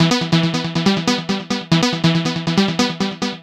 NRG Pad; Pads; Trance; Sonic Foundry (Sony Creative Software); Trance NRG VOL-1

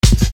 Snare Dr; Best Service; Ethnic Journey Sound Cube; EuroDance Essentials; Snares

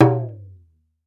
G, talking drum; Best Service ProSamples vol.55 - Retro Sampler; AKAI

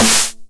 909 SD Tape Sat; Goldbaby Tape808 & Tape909; The Tape 909

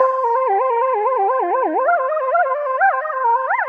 Mod Mix A, 130 bpm, C, modular; modular madness

